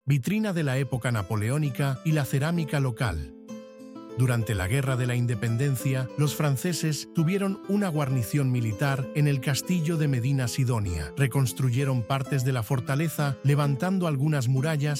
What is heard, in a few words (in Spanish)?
audioguia música